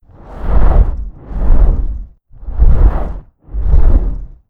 space dragon moving; Stellaris; guardians

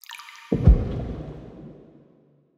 relic activation celestial tear; astral planes

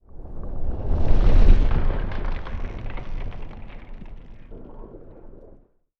ice asteroid; ambient; Stellaris

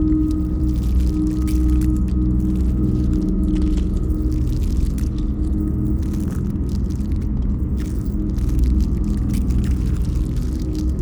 ship starbase idle; Stellaris; biogenesis

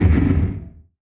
distance shield hit, weapons; Stellaris

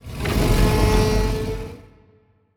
kaiju enrage; biogenesis

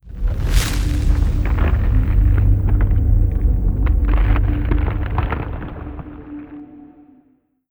space crystals; Stellaris; nemesis

